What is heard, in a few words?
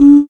Human Perc